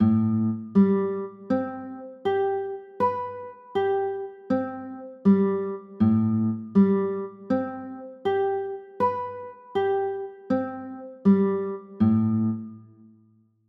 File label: a m9, chords